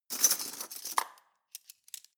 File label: cutlery teaspoons drawer grab soft; objekte; besteck